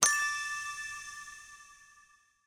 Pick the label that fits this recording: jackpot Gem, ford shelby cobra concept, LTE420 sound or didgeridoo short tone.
jackpot Gem